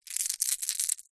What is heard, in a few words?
foley gemstones several small opal amethyst jade shake in hand